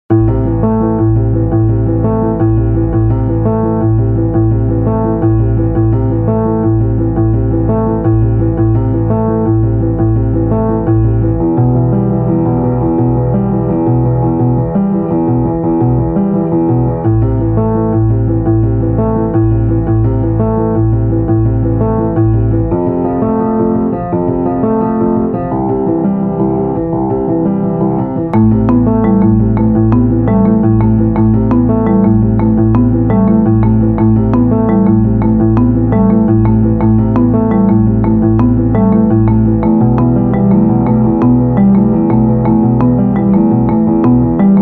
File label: soundtracks, 170 bpm